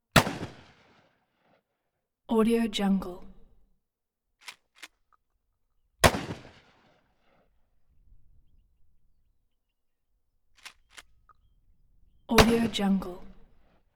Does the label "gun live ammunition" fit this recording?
Yes